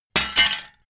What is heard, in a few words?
metal plate thin drop